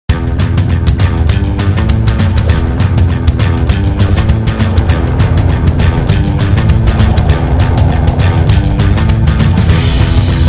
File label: Camera TV ad music; Samsung Galaxy S5